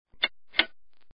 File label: call timer